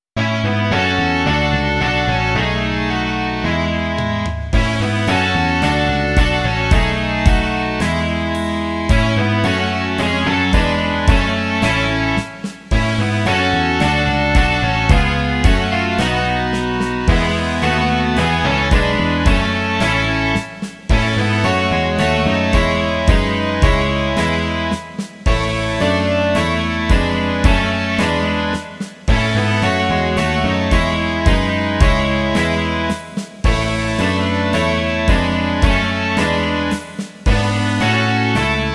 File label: rock